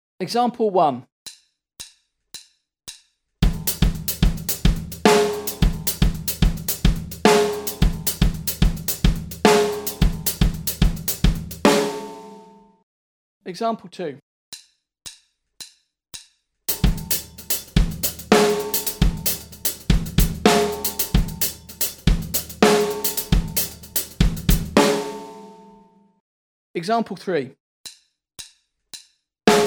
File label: Drum set